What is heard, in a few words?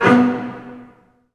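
Bass Spiccato B2; Digital Sound Factory Virtuoso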